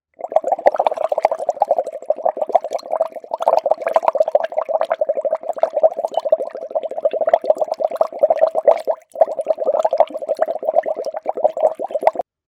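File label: sound of bubbling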